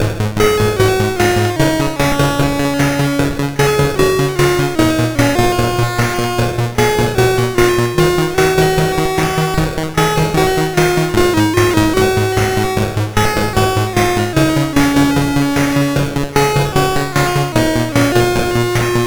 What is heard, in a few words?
Synth Sample III, CSG8580R5